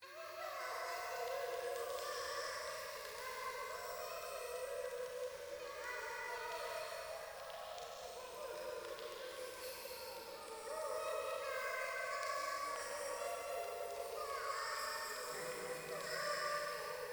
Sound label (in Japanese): ゴースト 可愛い喘ぎ 暖炉